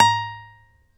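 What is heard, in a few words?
acoustic finger A#4, guitar; East West; Steve Stevens Guitar Samples Collection; ACOUSTIC FNG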